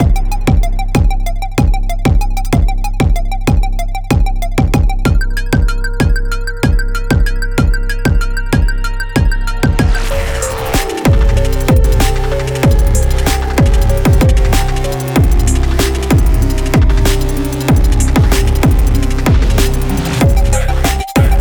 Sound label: Remix, Instrumental